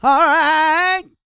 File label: GOSPEL